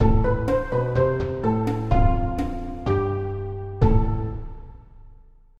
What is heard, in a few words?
Level complete; Battle Ranch